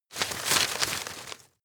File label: rustle hard short; berufe; foerster